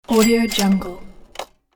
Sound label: antique cash register; sound ideas